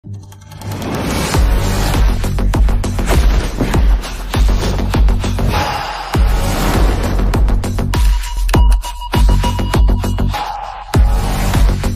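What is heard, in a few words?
trailer background music; devil; nandamuri kalyan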